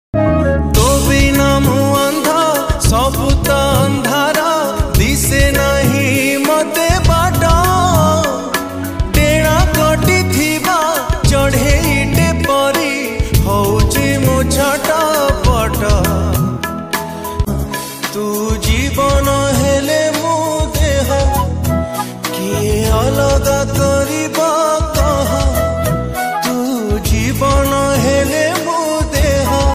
odia sad status; odia puruna sad status

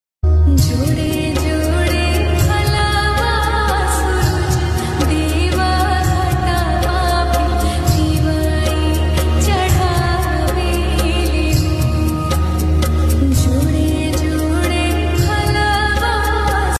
song love song

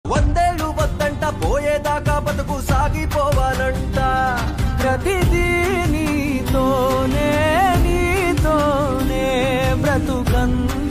sister love bgm song telugu; raayan

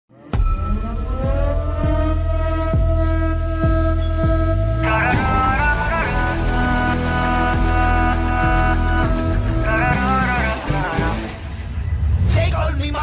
tamil love bgm; Beast; Maalavikka; Vijay

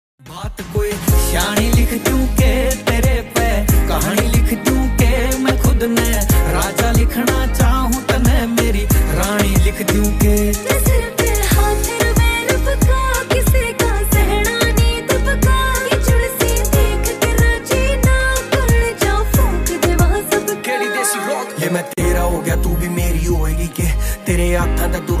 slowed reverb ringtone romantic love ringtone

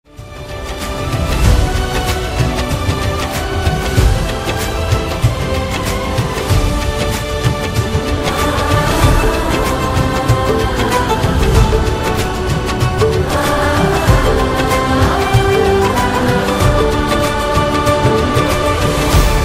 theme hindi bgm ringtone love bgm ringtone; rocky aur rani ki prem kahani

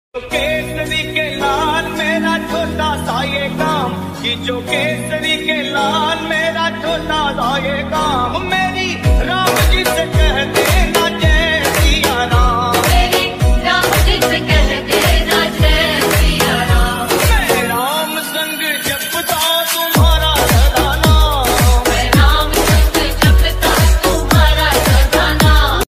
bhakti ringtone; hanuman ji

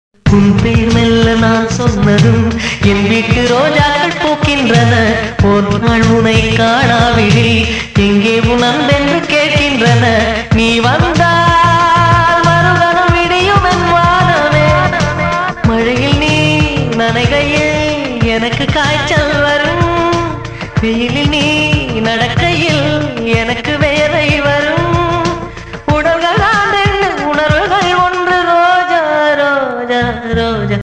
tamil love songs; kadhalar dhinam

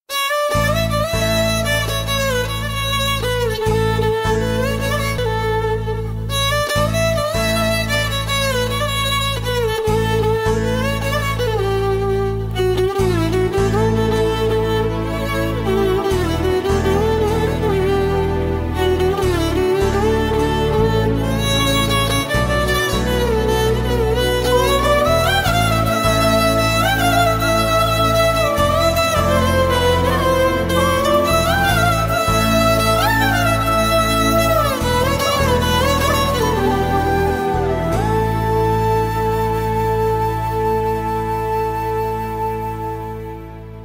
brother sister bgm, sad bgm; namma veetu pillai

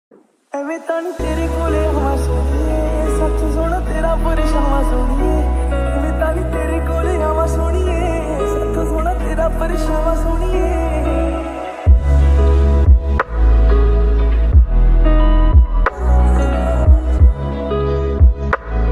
lofi remix ringtone

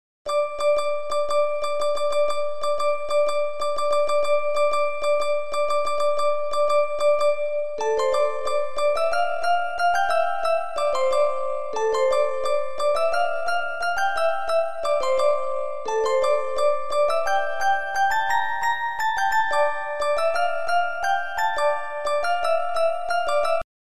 bgm perfect piano bgm easy piano notes; jack sparrow; pirates of the caribbean

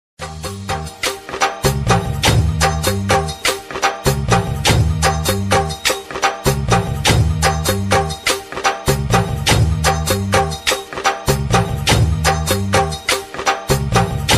garba beats, navratri special, bhakti ringtone

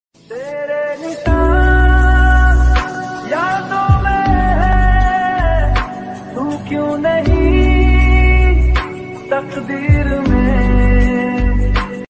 sad song mashup sad songs

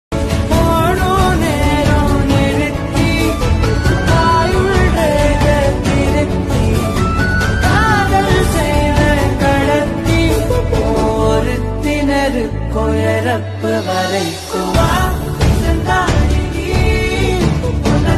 movie love song bgm ringtone song ringtone

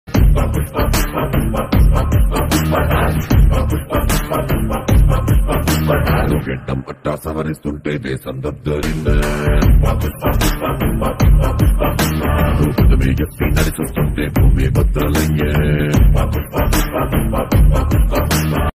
telugu ringtone; pushpa 2

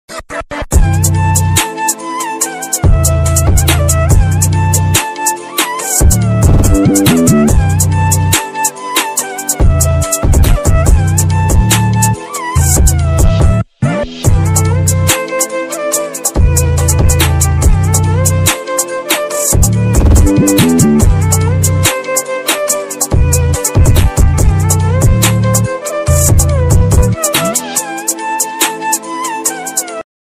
indian instrumental drill beat ringtone

